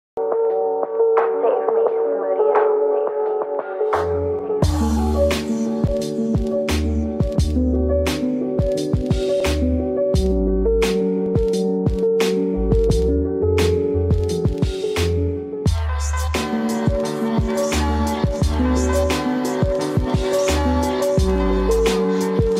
instrumental

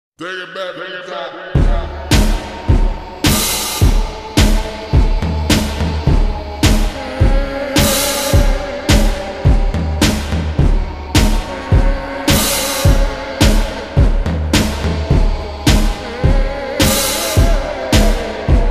slowed reverb

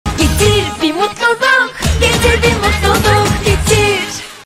jingle

